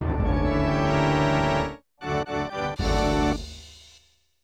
Fortress of Fear Realm Fanfare; Glover; Nintendo 64

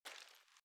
armour Light Step

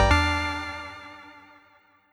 Key Pickup D

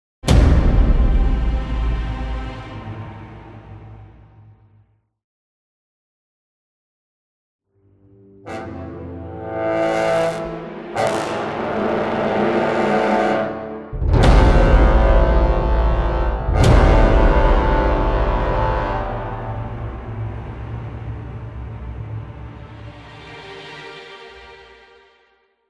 Music Moodsetter Russian Patrol; battlefield bad company 2

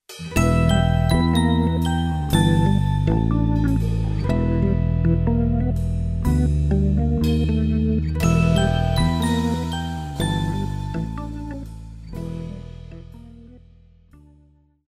Combat; MicroVolts Recharged

